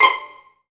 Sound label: Pizz C 5, STRING; Best Service Gigapack 1; STRING 2